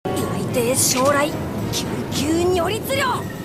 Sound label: thunder incantation